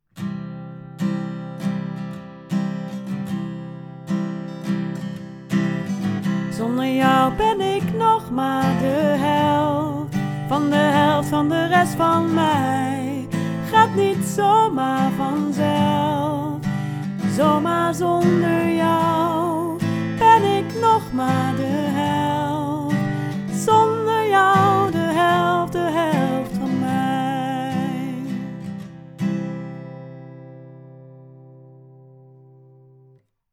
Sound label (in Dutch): middenstem